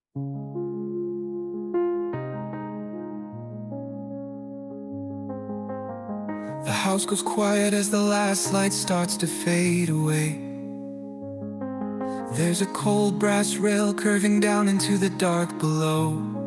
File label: zion lullaby